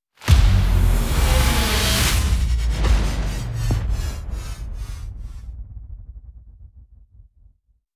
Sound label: Cool Down; Cairo